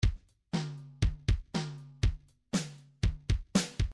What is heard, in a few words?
midi file grooves rock; Bdf Midi File Grooves